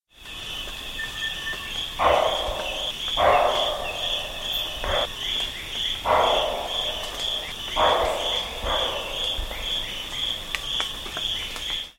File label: bushbuck